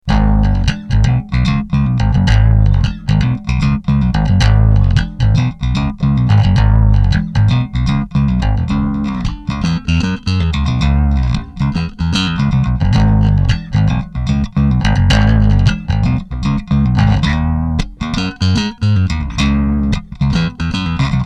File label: riff